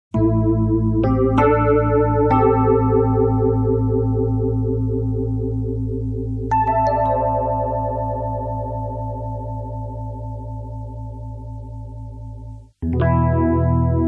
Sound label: rhodes polyph, Fender Rhodes